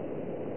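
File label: jet engine; SCI-FI; starwarsii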